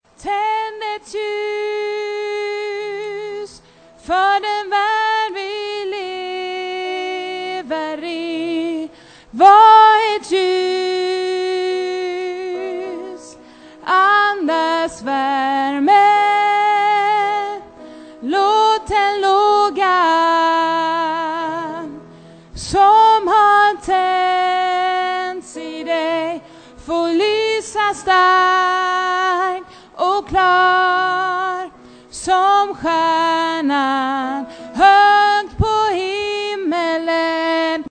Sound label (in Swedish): sopran